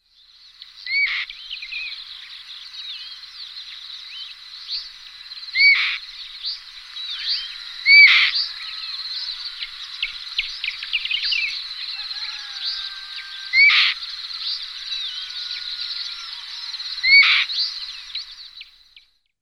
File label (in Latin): Elanus caeruleus